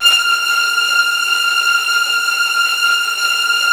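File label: STR TREMOLO, Virtuoso Strings, F3, right; ILIO; Kirk Hunter Virtuoso Strings VOL-3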